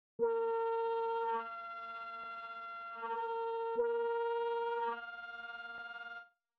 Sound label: synth